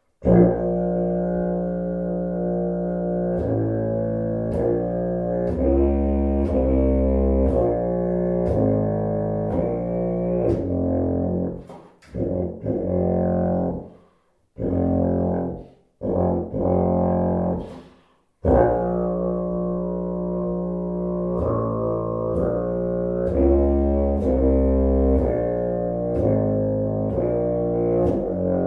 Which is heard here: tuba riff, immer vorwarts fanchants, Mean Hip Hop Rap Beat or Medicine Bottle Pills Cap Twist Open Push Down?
tuba riff